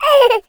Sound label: VOICE Girl 2yo Giggle mono